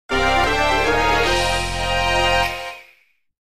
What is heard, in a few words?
Fanfare; Pokemon Evolution; Conquest